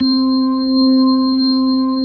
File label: C4S, B3